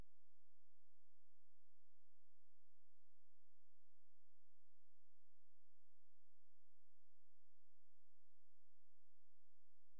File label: white noise inverse